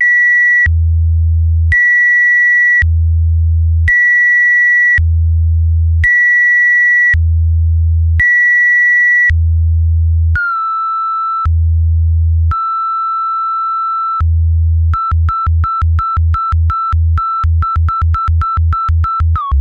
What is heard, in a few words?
techno